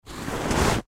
Footsteps Snow Single Step Impact Crunch; Weather; Foley; Blastwave

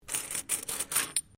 Coin Penny Multiple Move Wood

